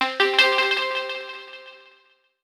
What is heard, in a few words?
JUMPPAD; impact; JUMPPAD 01